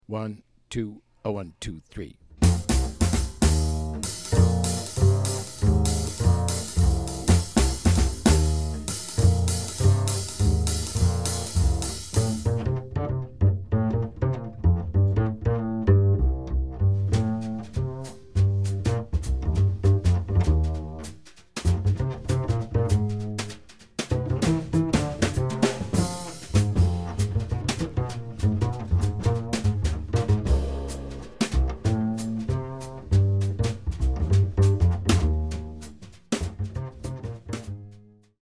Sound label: bass drums only